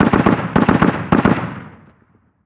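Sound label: gun 50cal distant; Call of Duty Modern Warfare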